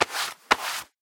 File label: Gloves Leather Work Gloves Hands Rub; hello neighbor pre-alpha alpha 1